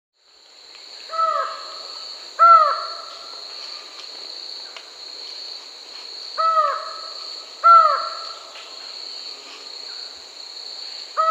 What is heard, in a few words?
Lined Forest-Falcon (Micrastur gilvicollis), Peru; S. America